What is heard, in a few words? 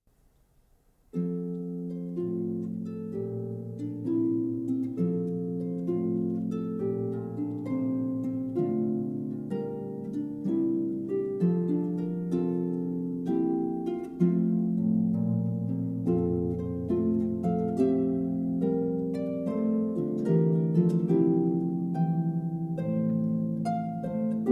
Harp; Air from Water Music Suite; Handel